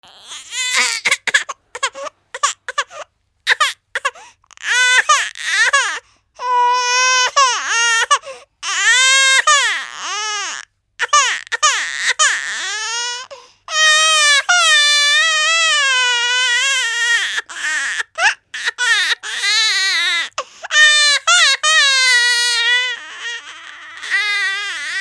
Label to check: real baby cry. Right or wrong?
Right